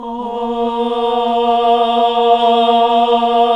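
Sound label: AAH A5 R, Nuns Aahhh; Sound & Vision - Gigapack II CD 1 (Roland); HAL NUNS AAH OOH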